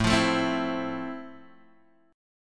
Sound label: F Major 7th A